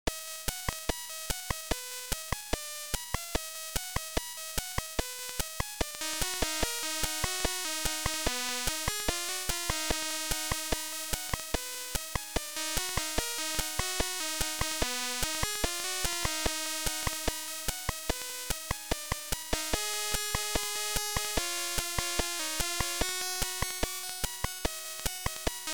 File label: beeper remix for rock band; Rene Sens; Laura Palmer; Dun Darach